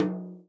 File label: tom, Real Drum Kit, jungle kit